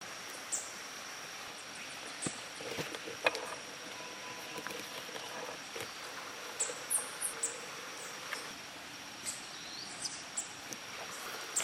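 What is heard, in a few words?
Purple honeycreeper, bird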